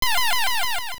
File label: Staff Shoot; Ganon shooting a blast from his staff (repeats every 4/30 seconds); Zelda Oracle